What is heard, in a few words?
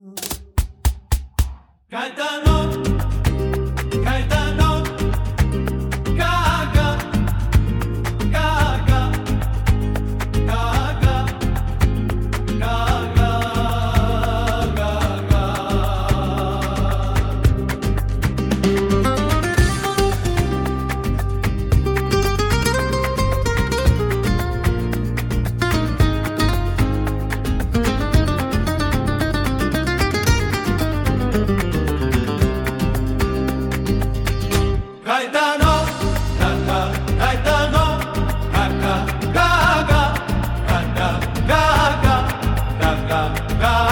flamenco